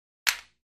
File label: Free Weights Exerci